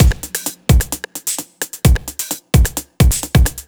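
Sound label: 808 No snare, Drum Loops 130bpm; Zero-G; Soundclash Nu Dance Electro; Acidized Wavs; 808 Land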